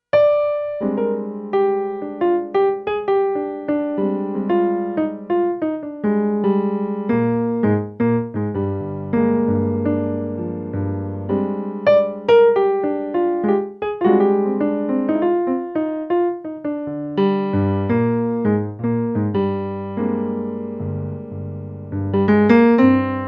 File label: fragment in arabic